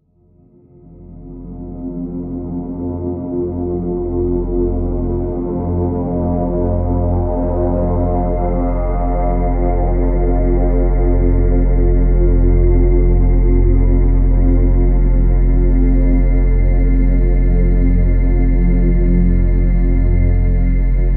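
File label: drone F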